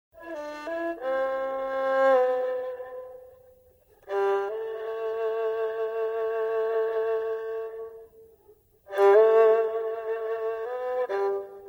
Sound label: Professional Erhu